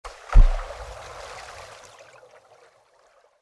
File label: Stone falls into water (under water)